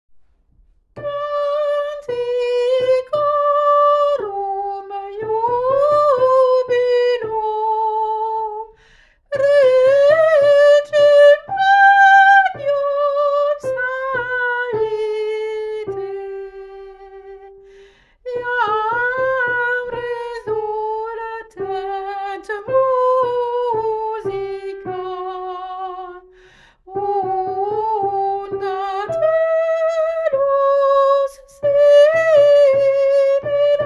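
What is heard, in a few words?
soprano, vocal; CANTICORUM; Montacanto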